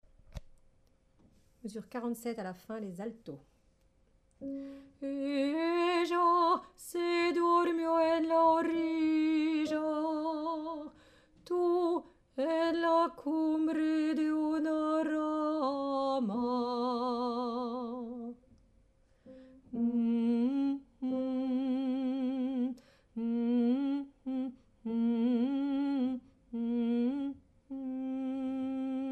paloma Alto

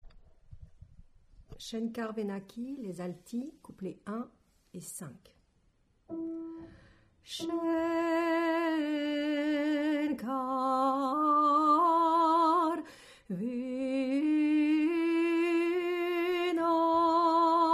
Alto; Shen Khar Venakhi

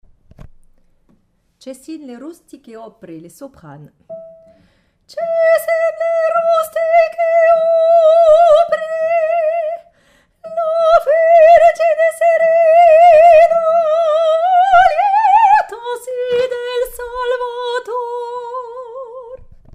Soprano

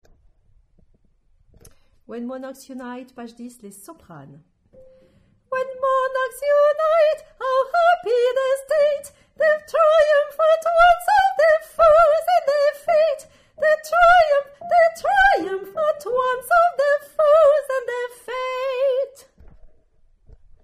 Soprano; When monarchs unite - Purcell - Didon & Enée - 05

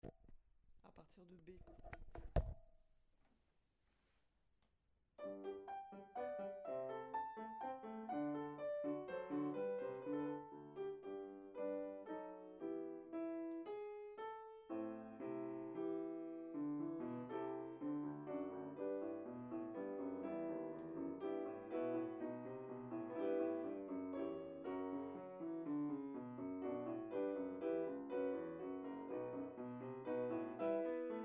piano

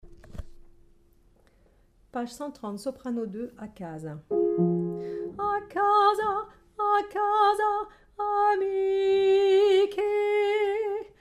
Soprano

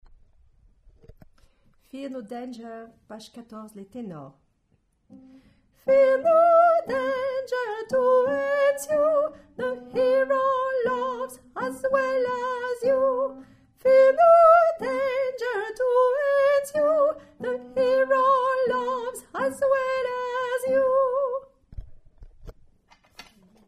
Tenor

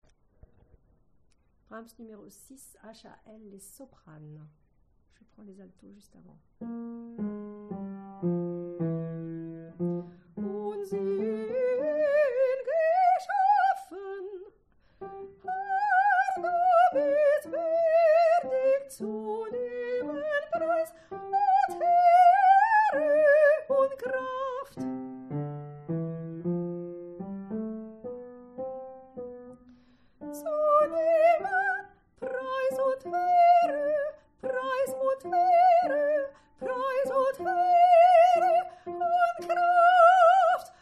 Soprano

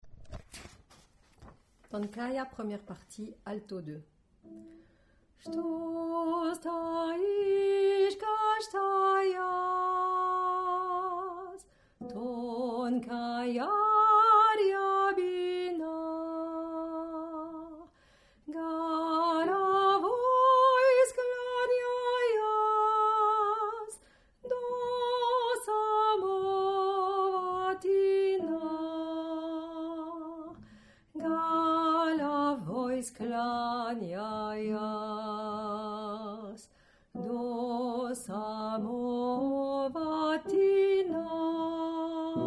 Alto

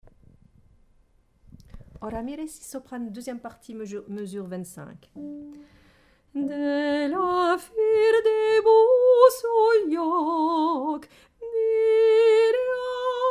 Soprano

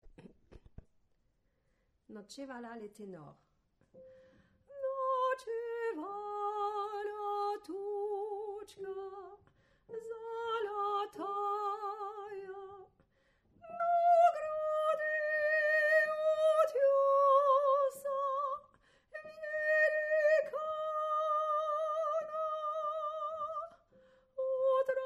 Tenor; Ночевала тучка золотая

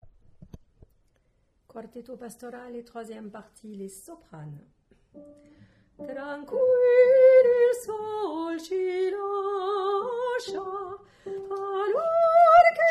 Soprano; quartetto

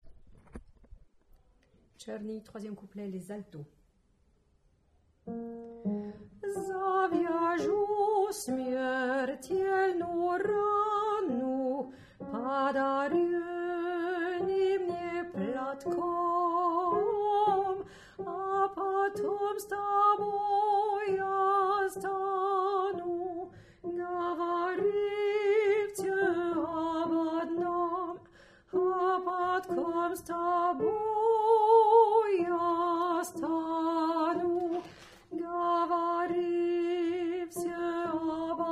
Alto

